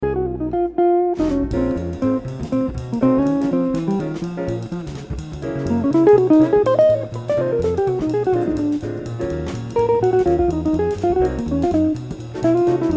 solo

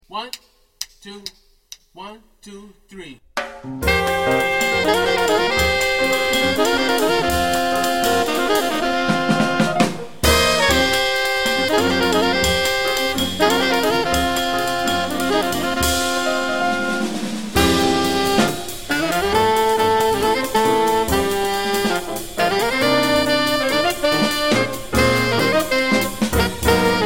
minus bass

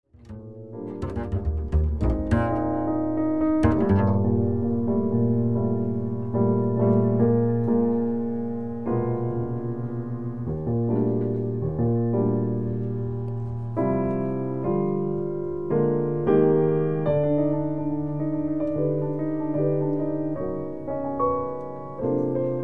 trio drum solo

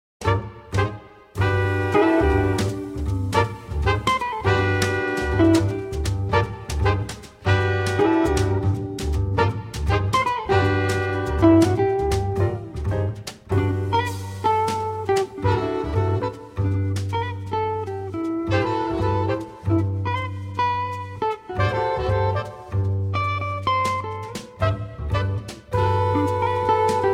soulful jingle bells

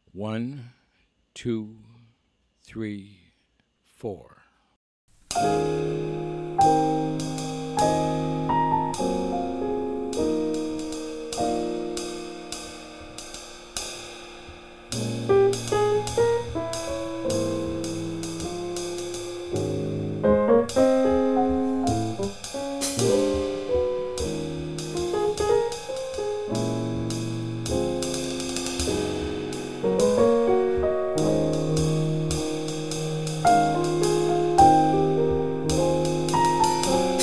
Waltz minus Bass

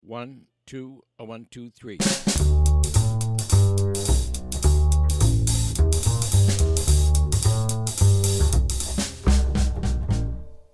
minus piano

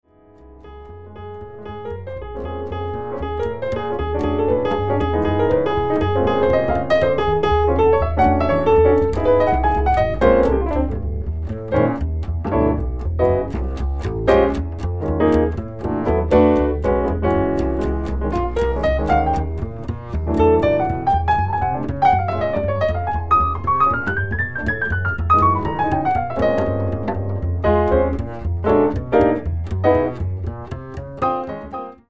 drum trading

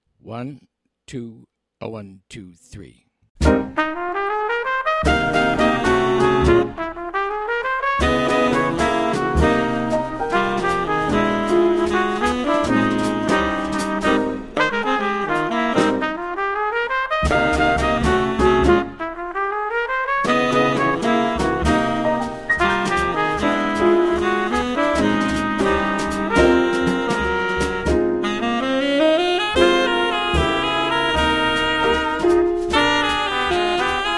minus Bass

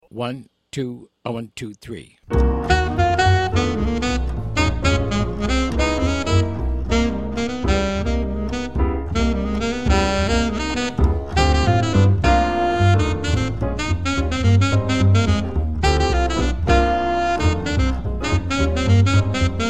minus drums; sticks throughout (snaps added on trading)

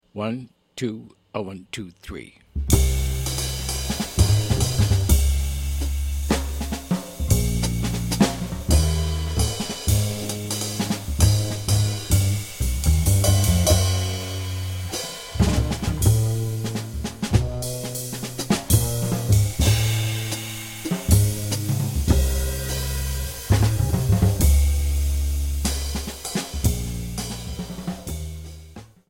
minus piano

